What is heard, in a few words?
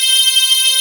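synth C6; Best Service Gigapack 1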